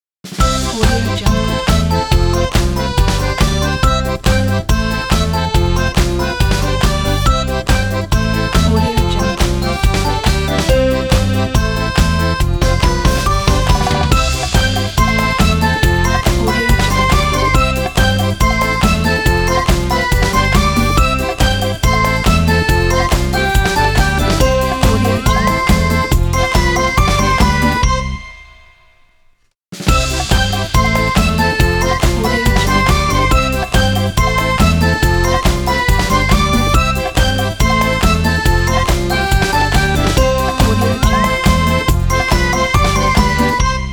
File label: happy accordion whistle ident; puremusic